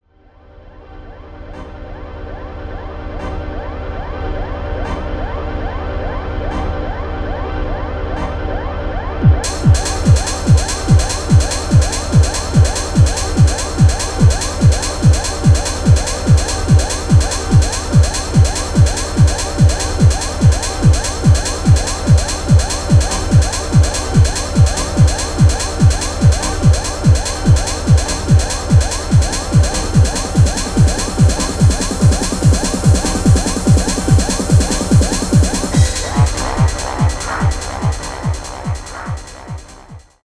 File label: Hard Trance Mix